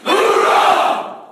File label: USMC OohRah